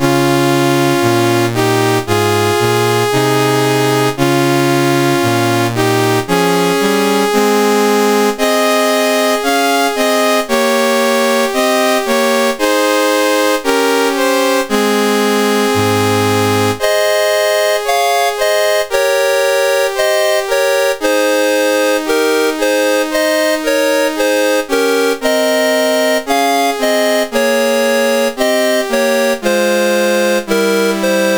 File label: Burma National Anthems, CSG8580R5